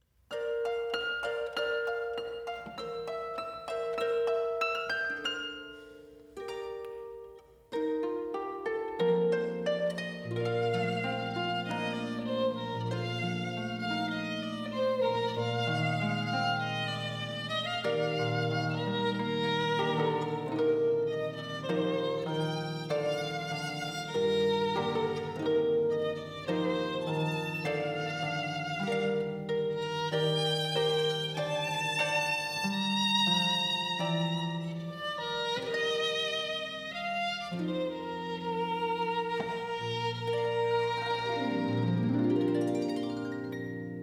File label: Duet of Violin and Harp